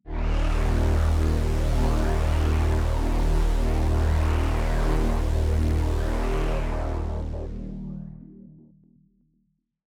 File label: Tech Synth D#1, techno 120bpm; musicradar; Synth P Multi